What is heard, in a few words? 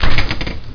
Fridge Open